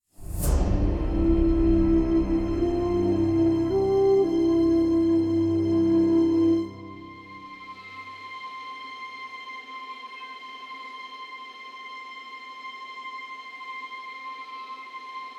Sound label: Night Fall Music; Night Fall 01